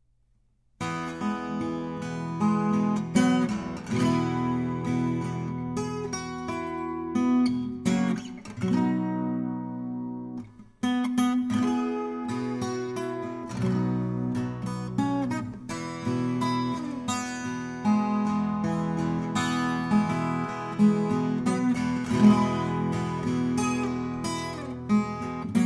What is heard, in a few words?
The National Anthem on the Epiphone 12 String